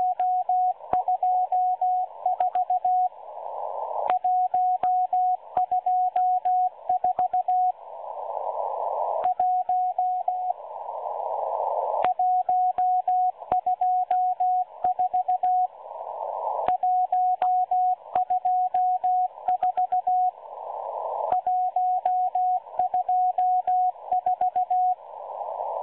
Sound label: Morse Numbers Station 8116 kHz CW